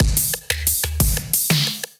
Acoustic Australia Kit, Drums; Ableton; Drive and Glow

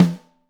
SN2 Top, Snare; Ableton Foundation FreePacks; Snare Designer

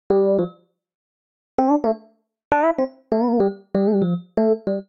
Piano D Minor 86bpm; MIDI Clips; Melodic; Ableton; Factory Packs; Chop and Swing

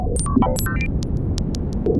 Windy Transmission; Tree Tone Presets; Ableton; Factory Packs; Inspired by Nature; Dillon Bastan

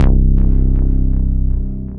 Better Bounce Bass; Ableton; Punch and Tilt

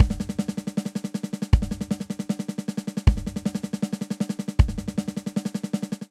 Twist Buildup 157 bpm, Drum; 4 4; MIDI Clips; Ableton; Factory Packs; Drum Booth

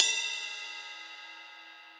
Ride Bell Warm And Low; Drum Hits; Standard Hits; Ableton; Factory Packs; Session Drums Club

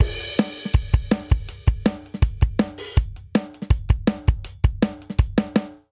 Punk Rock Verse 162 bpm; 4 4; MIDI Clips; Ableton; Factory Packs; Drum Booth

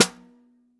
SN1 Bottom, Snare; Ableton Foundation FreePacks; Snare Designer

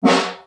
SN1 Far, Snare; Ableton Foundation FreePacks; Snare Designer

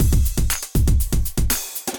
Acoustified Kit, Drums

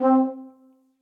Short Soft, Brass Quartet; Ableton; Spitfire Audio; Individual

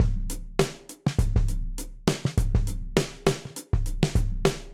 Classic Muffle 101bpm, Drums; Meteorite; Turn3; Ableton; Factory Packs; Session Drums Studio

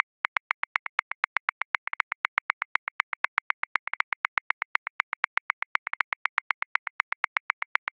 Linear Funk, Grooves; Drum Booth; Ableton

